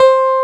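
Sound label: Clavinet soft C2; USB Soundscan vol.09; AKAI; Keyboards Old School; CLAVINET1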